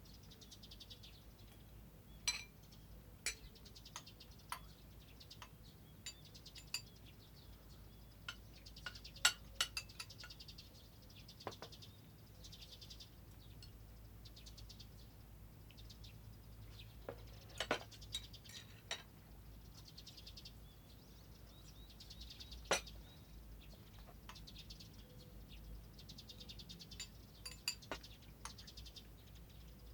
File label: indoor janitor room mealprep; LOOP; anemoi